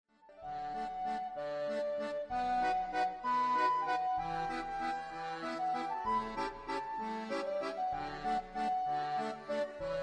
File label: traditional Dutch